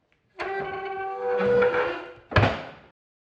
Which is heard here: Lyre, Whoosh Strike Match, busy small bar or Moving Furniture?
Moving Furniture